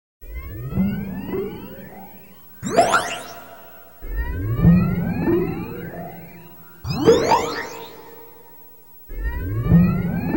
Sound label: synthesizer; SOUND EFFECTS1